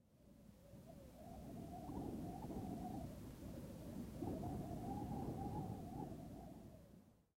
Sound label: grass